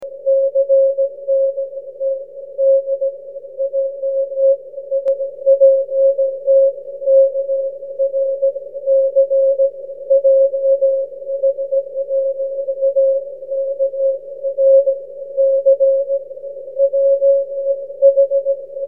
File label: cw; 23cm